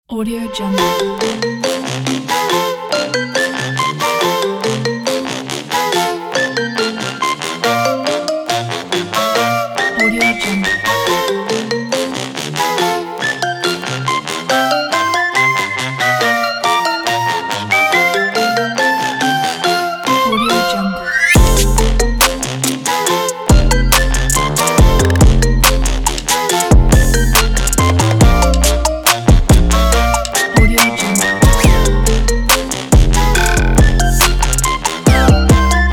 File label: children fun